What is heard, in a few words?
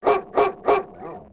spike